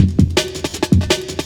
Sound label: Funki Cut, Jungle, Drum Loops 163.5; Zero-G Jungle Warfare 1, 2 & 3